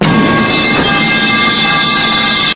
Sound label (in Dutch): grote knal